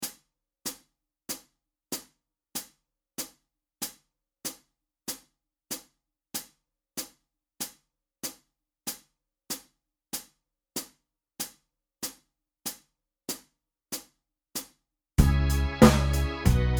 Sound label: 95bpm; Parcours Acoustique; guitare